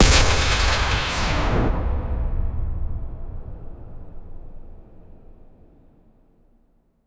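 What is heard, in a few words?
plasma rocket hits armored, warfare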